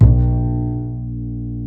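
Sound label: double bass A#1, Jazzistic; Univers Sons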